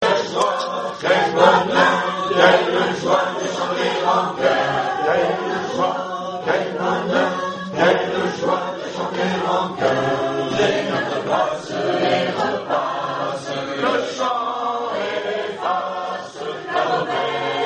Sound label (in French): sonnerie entier tous